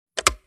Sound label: Cassette tape recorder push play button